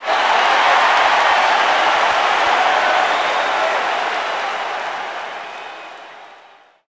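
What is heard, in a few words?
Basketball Crowd Large Cheer; Crowds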